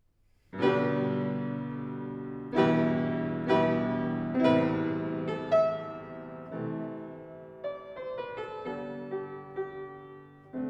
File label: Sonata for violin & piano in G major, Adagio; Mozart